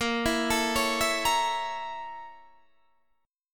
A sharp Minor Major 7th Flat 5th A sharp Guitar Open D